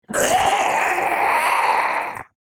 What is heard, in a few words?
Demon beast imp monster growl grunt